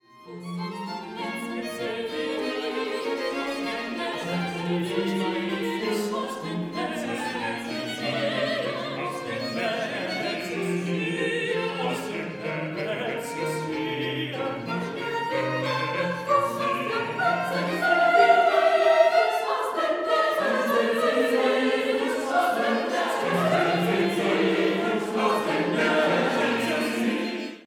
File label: classical dry